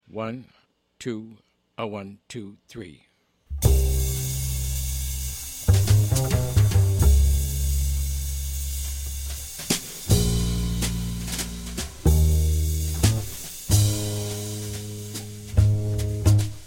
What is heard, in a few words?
slower minus piano